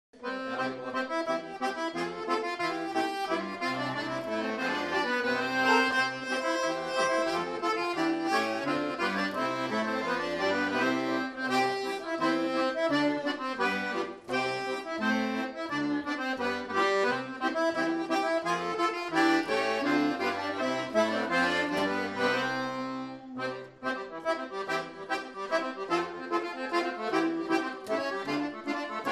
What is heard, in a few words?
accordion; Pål sine høner